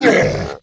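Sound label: Were Bear Damage; Seer; GamigoNA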